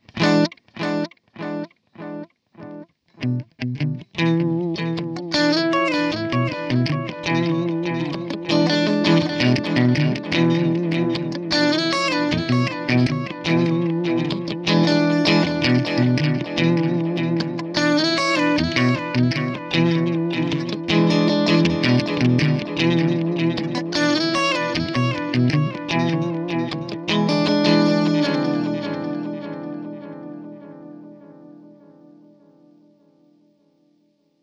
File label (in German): EQD Silos Delay Analog alle Regler 12 Uhr